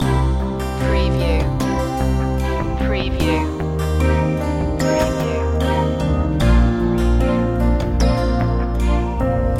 natural flow loop

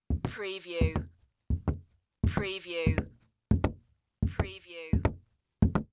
Footstep Wood Walk

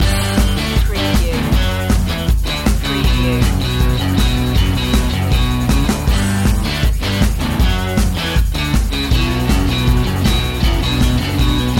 dirty fun loop

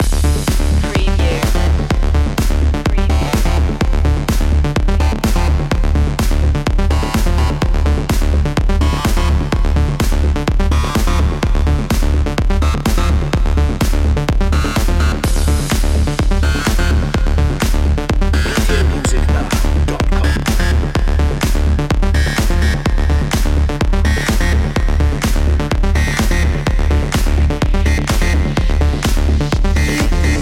body building loop

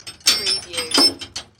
Chain Metal Clanging

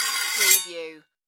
Sword Scrape

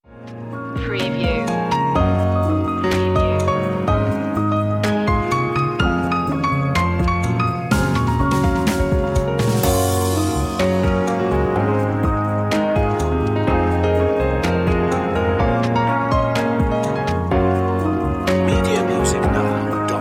thinking no guitar; media music now

